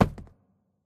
phys cube, physics

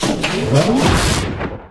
citadel breaker shut; ambient; source engine; episodic

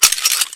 zipline, combine soldier; episodic; Source engine

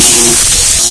ball zap; Source Engine; video game; npc; assassin

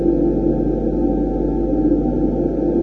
abstract air, tones

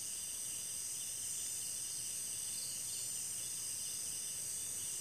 jungle insect loop; Source Engine; tf; lair

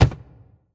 phys cube, physics

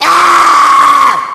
smoker warn, voice; source engine; left 4 dead 2

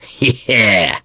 positive noise, mechanic, survivor, voice

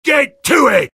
battle cry; sniper; source engine; tf

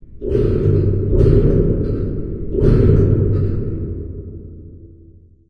amb muffled lo mach; video games; ambient

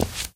walk tile, footsteps

